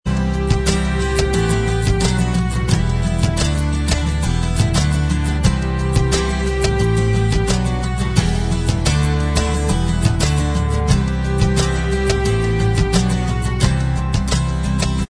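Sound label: acoustic version instrumental track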